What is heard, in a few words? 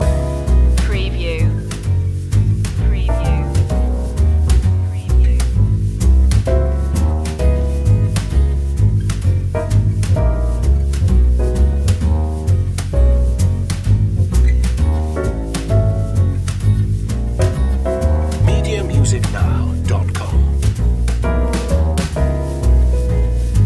swinging life loop